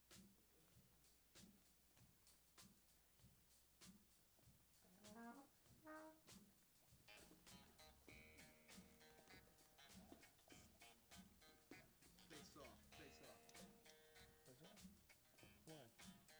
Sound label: horns; homerecording